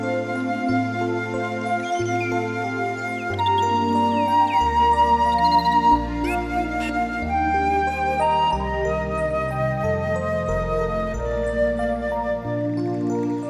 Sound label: dolphin love